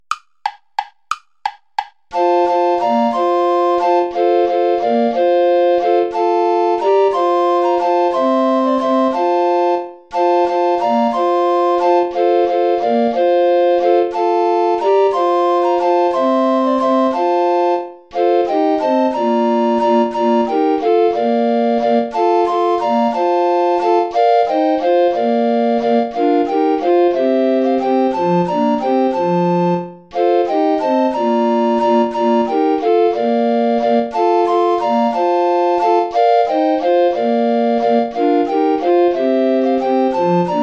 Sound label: play along without soprano recorder; gastoldi giovanni giacomo; dir ist freude